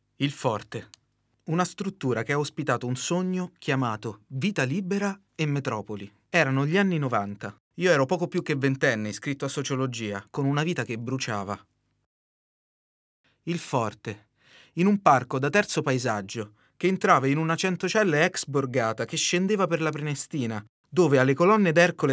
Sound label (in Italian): solo voce